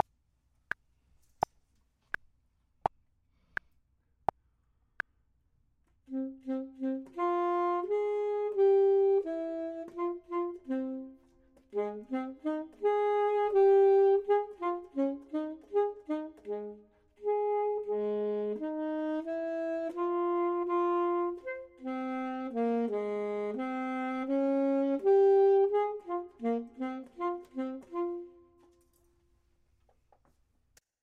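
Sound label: bottom, Saxophone and Clarinet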